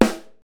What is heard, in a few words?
natural acoustic snare sound A key, steel snare drum tuned to the A note